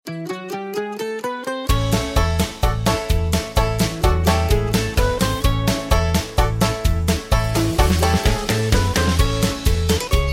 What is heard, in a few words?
harmony